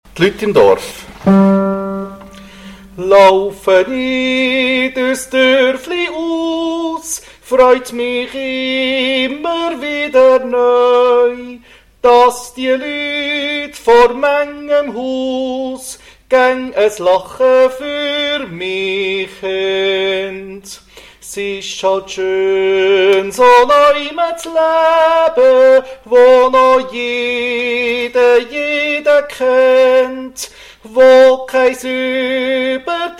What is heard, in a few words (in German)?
2. Tenor